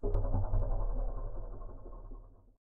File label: Pipe Noise; Lethal Company